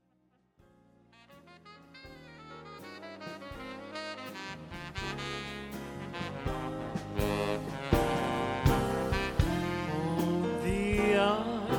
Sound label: LIVE Big band